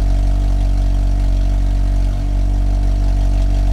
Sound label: car motor